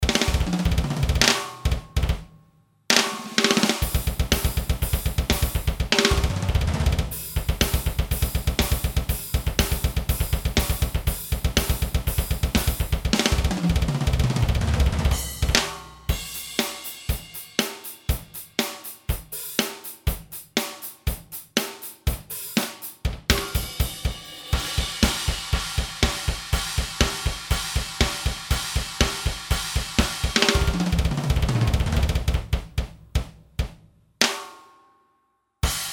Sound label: drums